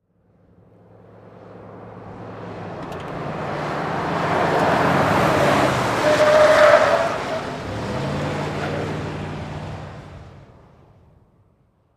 Expedition Fast Corn, ford expedition

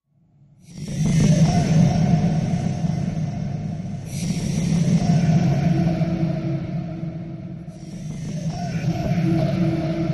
Ponderous Swirling, horror

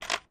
Single Camera Clicks

